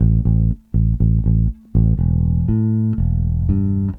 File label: Bass, 70s Analog Funk